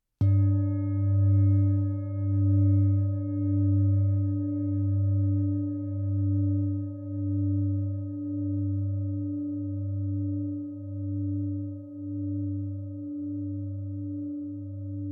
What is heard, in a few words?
chakra bowl